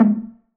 Temple Drum Vinyl, One Shots, Drums, Misc Percussion